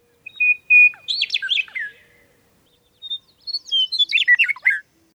Meadowlark W

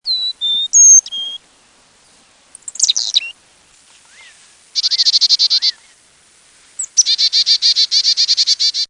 Chickadee Car

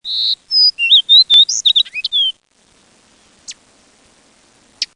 Sparrow Fox

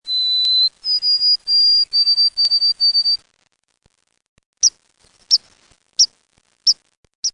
Sparrow Wh Thr

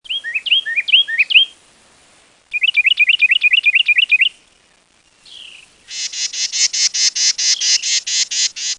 Wren Carolina

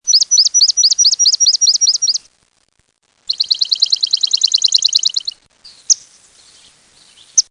Sparrow Swamp

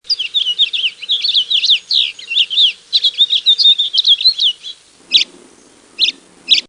Finch House

